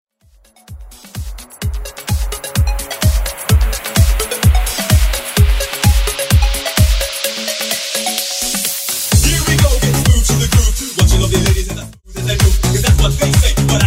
Remix 128BPM

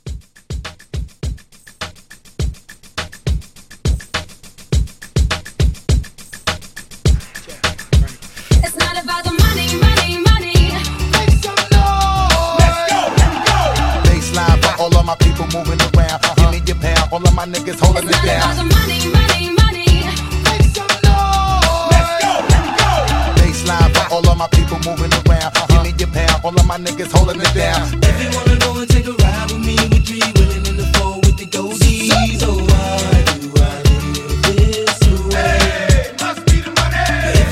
Multi Blend 103

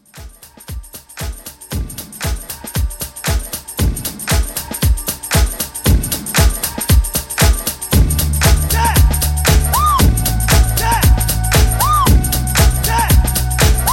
Mashup Re Drum, 116

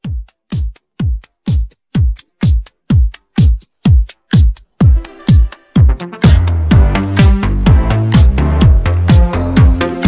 Club Mixshow

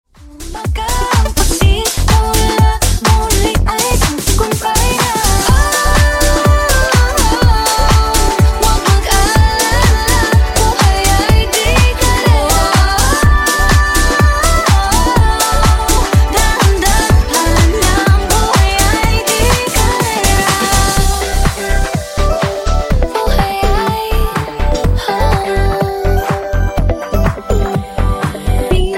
Funk Nudisco 124Bpm

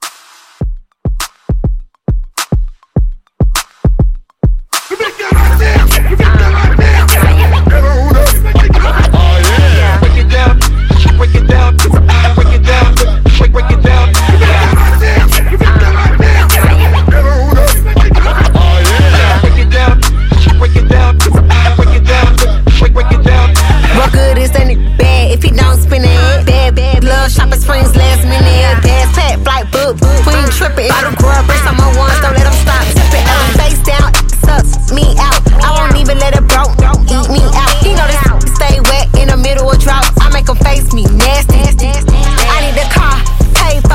Hype Re Drum

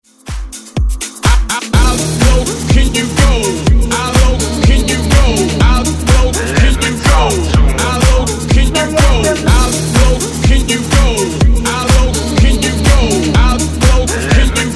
Dance Hype; Redrum

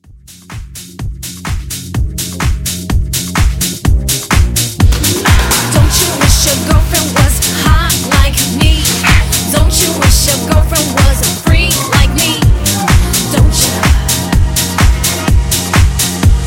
Club House Edit 126 CLEAN

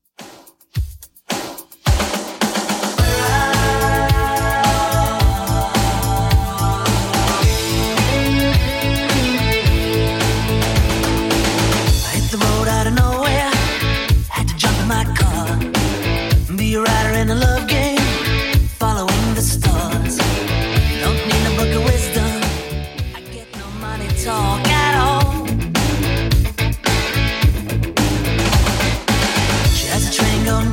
90s Redrum 108bpm

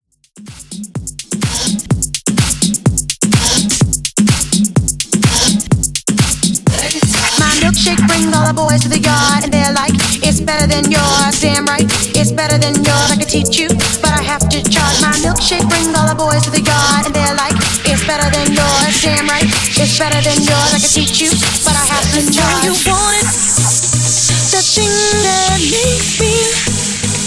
Tribal Mashup 126Bpm

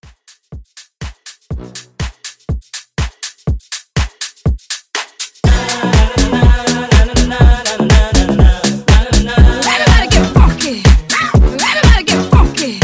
House 122; Mixshow Edit

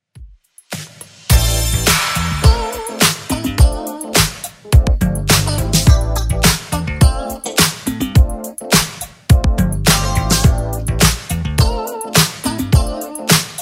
80s redrum 105 bpm

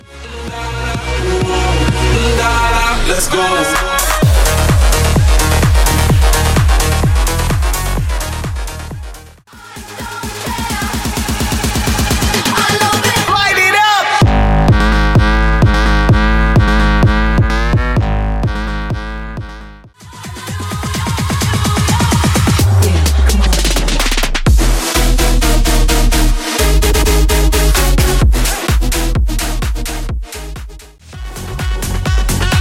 Festival House Mashup Bootlegs, 128 130

